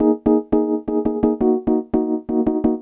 Rhodes

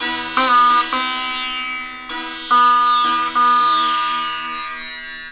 SITAR, Indian Traditions

